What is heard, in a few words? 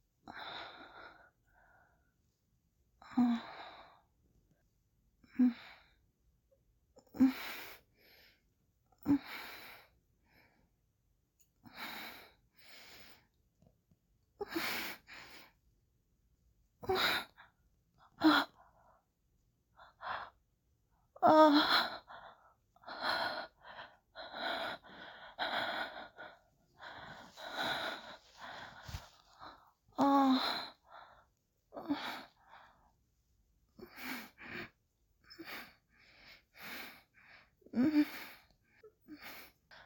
Whimpers, breathy, whimpering, minimalist, no rambles, girl noise; [sensual]